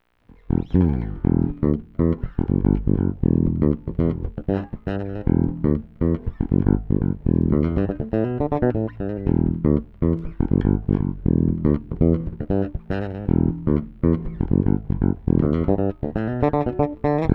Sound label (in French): Groove Chevalet Tone Ouvert Ferme